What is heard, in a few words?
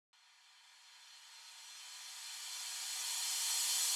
Drums A